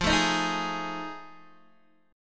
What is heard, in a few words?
F 7th Sharp 9th F Guitar Open D; x,8,7,9,6,6